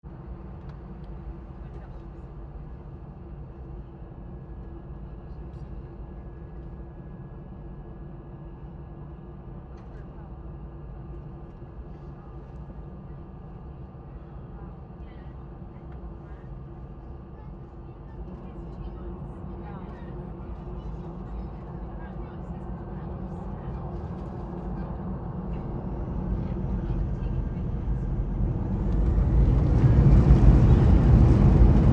Aircraft Take Off Internal Light Walla Jet Engines Airbus 320